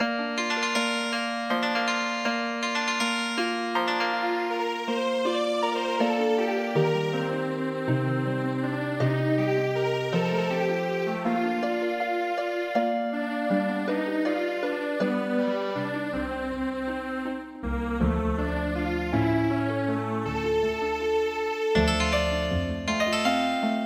Healing Harp, midi; Mattinata

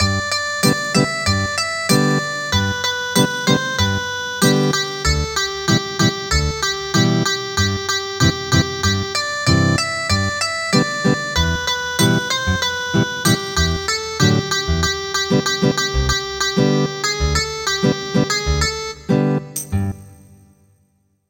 Ethnic Guitar; Long I gaze across the snow

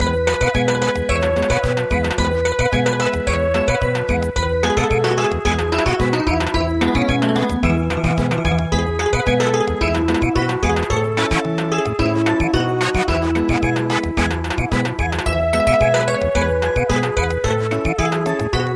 Samba; He is born

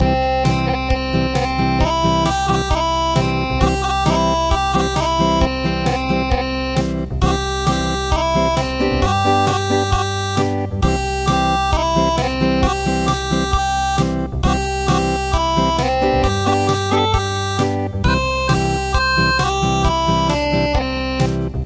Acoustic; Gom se mali

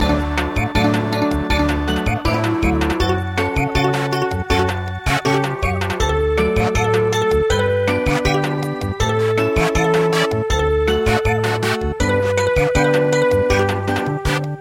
Samba

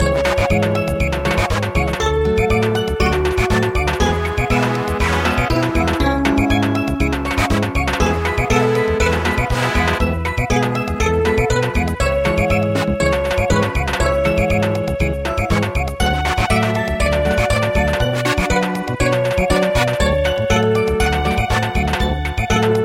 Samba

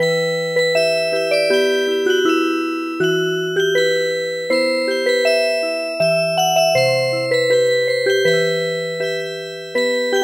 Music Box; Danchone Bushi

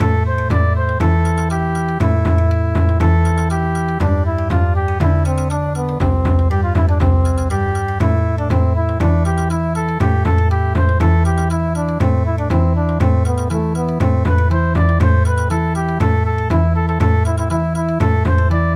Bon Odori; Arohnee

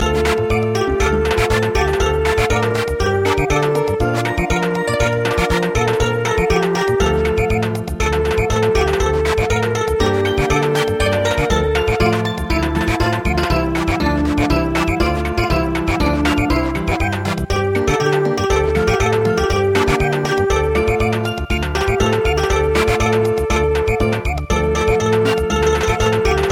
Samba

